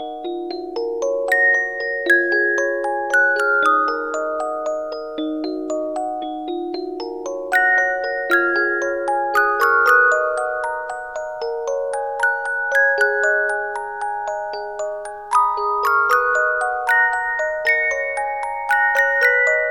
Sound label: Music Box